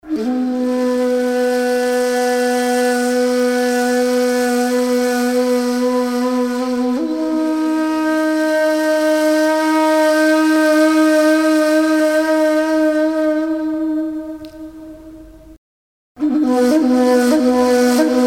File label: oriental flute